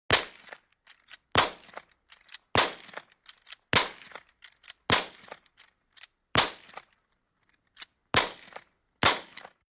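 Rifle Civil War Rapid Fire, repeating rifle exterior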